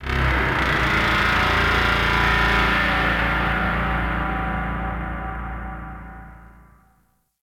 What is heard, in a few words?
Machine Activate Rattle; Venus Open World; Objective Rooms; Deck Twelve